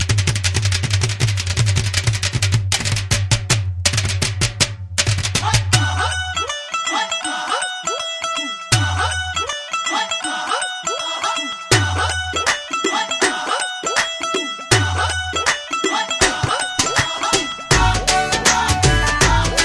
Dhol

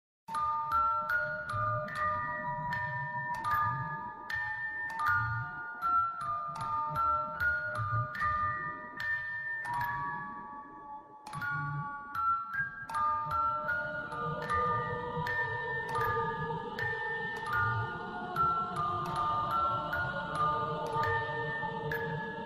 Msg Tone

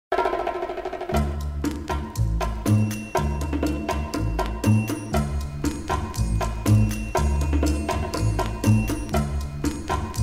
Bongo

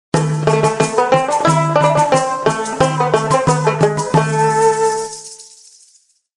Failed

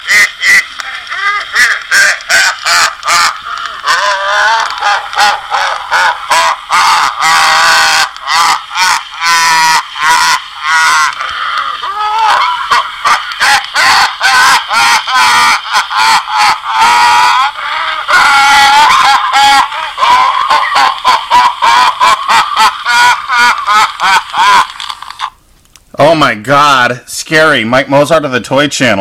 Evil Laugh

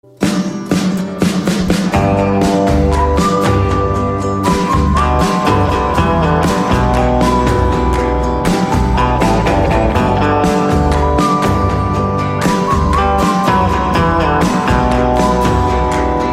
Tone - Mpb

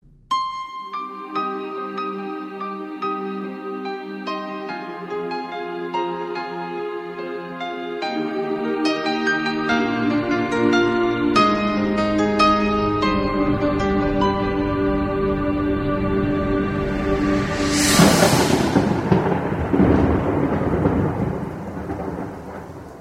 beautiful music

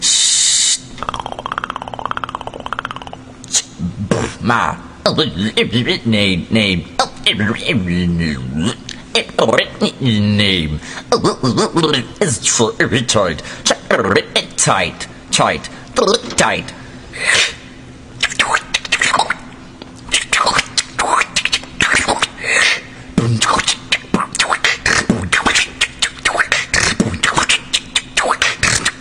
Human Beatbox

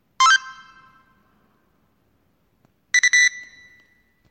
Police Bodycam

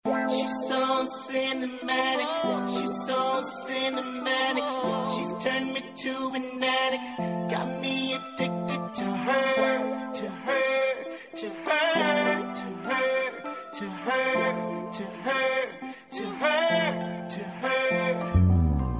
Cinematic

